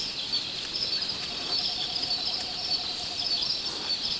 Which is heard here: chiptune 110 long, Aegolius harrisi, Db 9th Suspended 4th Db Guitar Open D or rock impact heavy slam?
Aegolius harrisi